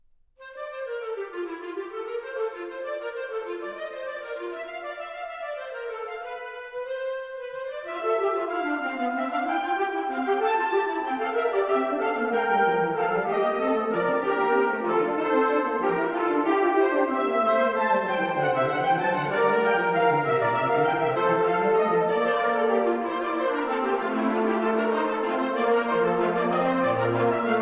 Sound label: pastorale for organ